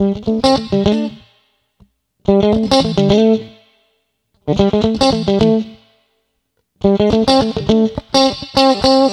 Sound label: Electric Guitar; Sonic Foundry (Sony Creative Software); Mac Money; Guitars